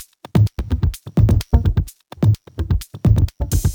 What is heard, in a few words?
Minimal Loops